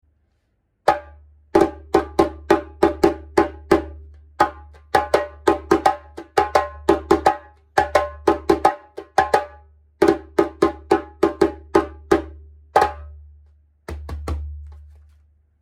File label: djembe